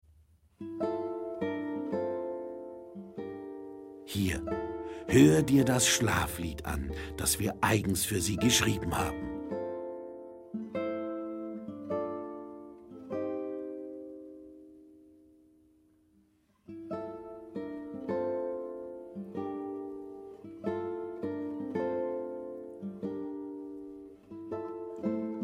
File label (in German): Wiegenlied bei den Stieren